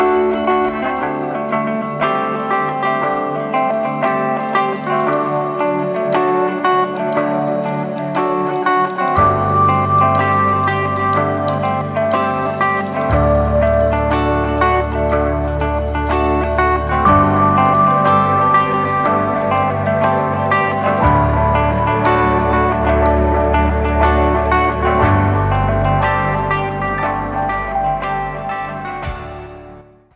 creep funk

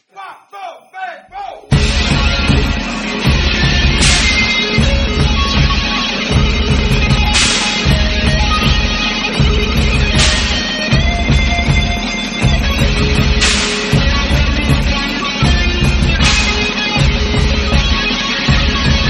donkey beat

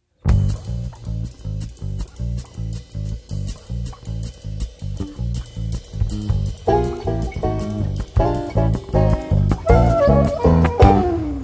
jingle

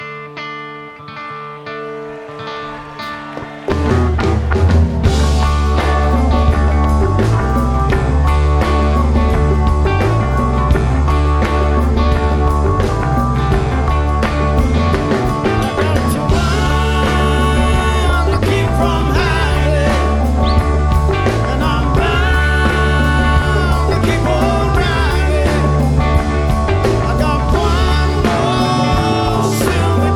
jazz

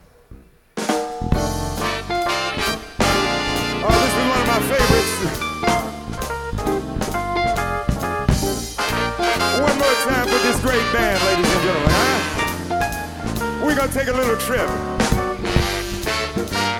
jazz blues

